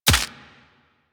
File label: Bullet Impact Head Hit Notification; Warframe; Weapons